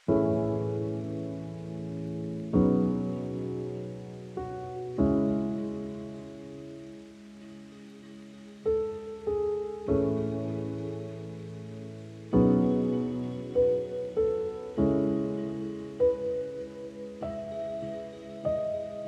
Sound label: lofi hip hop